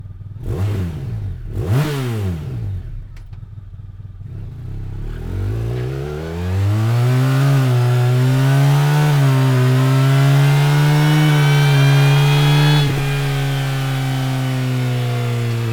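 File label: slip on, exhaust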